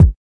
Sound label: kik